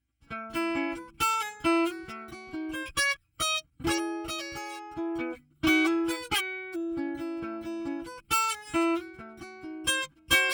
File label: Hummingbird Shure Beta 58A, guitars